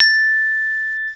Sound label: GLOCK, MALLETS; CMI Sound Library Rev 1.3